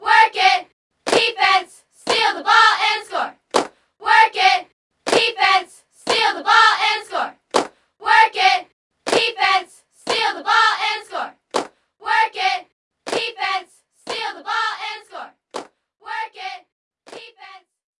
Sfx Basketball

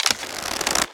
Pecheneg Archer Bow

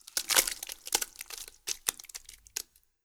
Pirate Moovegore; Legend of Keepers (gamerip) (2019)

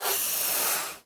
Rattle Snake; rabbids multiverse; iOS, macOS, Mobile

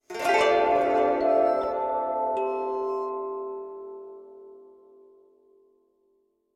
start expedition jingle; curious expedition 2 macos ps4 switch windows xbox one xbox series xs gamerip 2021

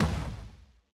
Bear Step

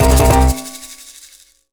beach boss stack topple; Donkey Kong Country Returns HD

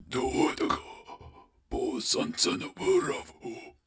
Waterway; Gs Waterway

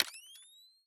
Mounted-Camera Timer Start

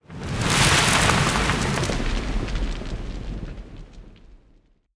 Hydra Fire

